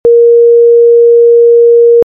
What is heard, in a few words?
Horn